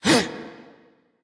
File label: grunt attack; Smite; bellona